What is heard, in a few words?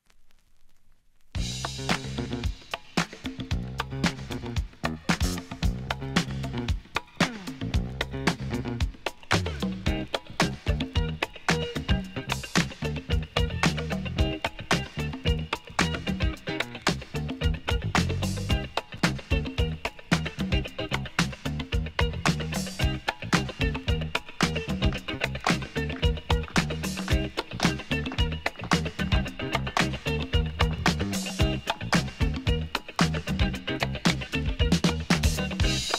A Collection of Boogie, Jazz Funk & Disco